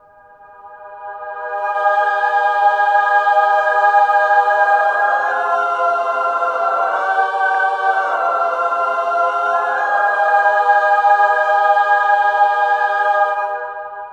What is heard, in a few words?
MINOR W REV, women, voices